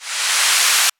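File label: White Noise, Roland SH-32 Drumset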